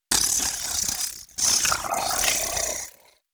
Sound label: Fly Idle Break; Mars Sortie